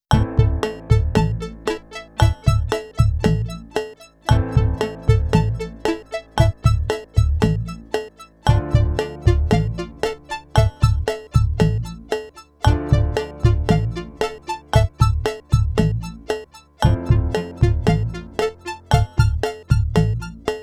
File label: minimal